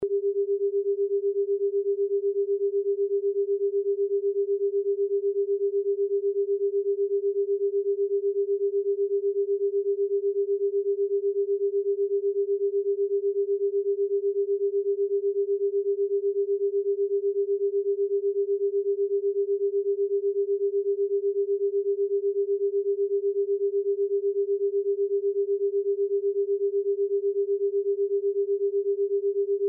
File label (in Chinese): 身心放松